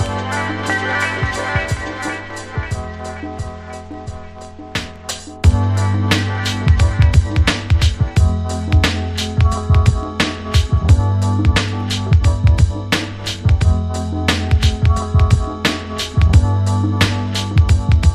REMIX INSTRUMENTAL

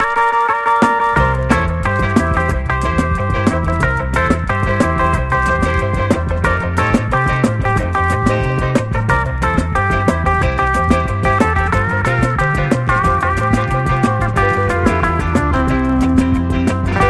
rock n roll music; sampling source; CAPITOL

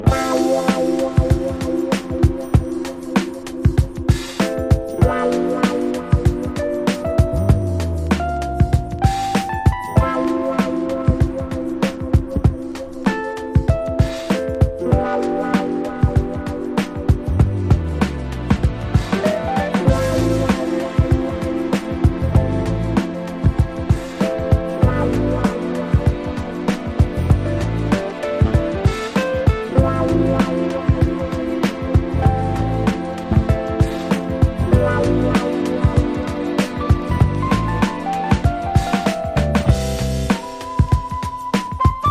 FLUTE MIX

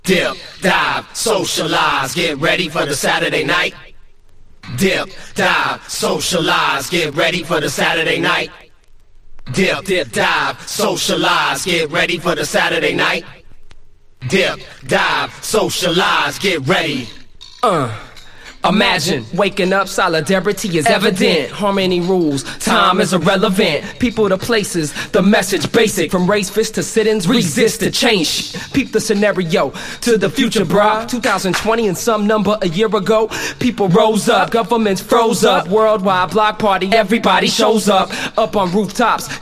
REMIX ACAPELLA

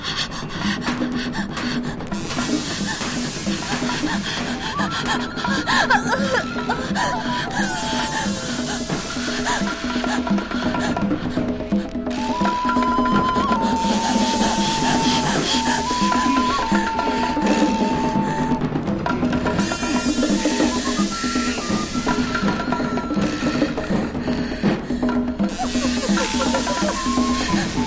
JUNGLE DRUMS; space-age

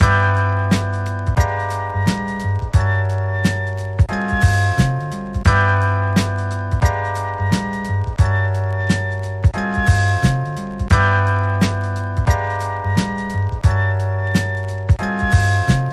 FLUTE

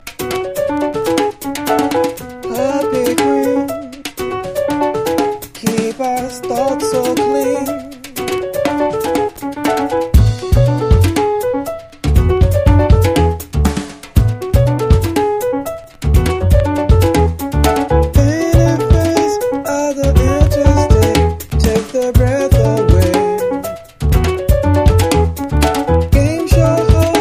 COMBO PIANO

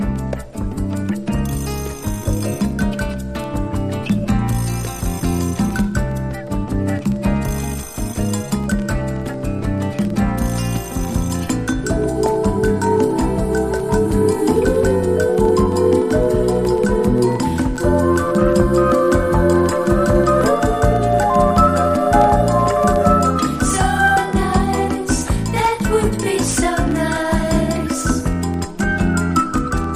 BOSSA SAMBA

